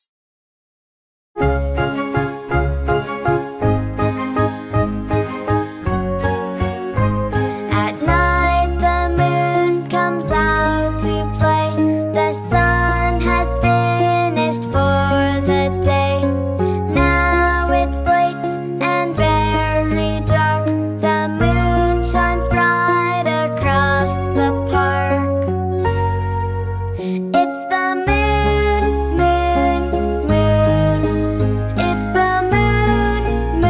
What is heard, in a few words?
lullaby